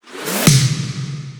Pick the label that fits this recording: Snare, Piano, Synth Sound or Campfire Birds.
Snare